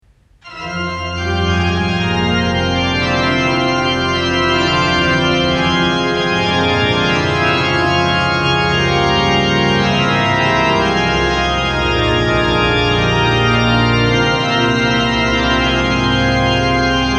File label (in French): plein jeu; Guillain